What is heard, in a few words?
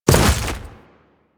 Step Ice, Footsteps; Warframe; Grineer